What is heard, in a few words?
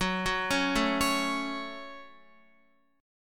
F sharp Minor F sharp, Guitar; arpeggiated; JGuitar